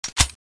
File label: mauser96 empty bolt pull